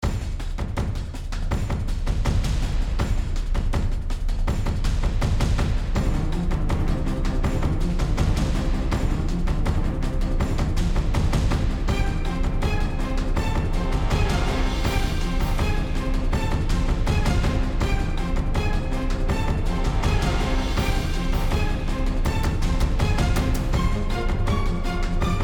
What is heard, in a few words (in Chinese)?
背景音乐; 穿越火线